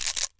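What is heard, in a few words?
COW RATTLE, PERC; AKAI S6000 CD-ROM - WorldSounds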